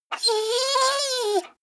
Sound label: dog whine; natur-tiere; hunde